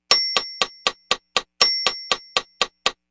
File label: met 6 8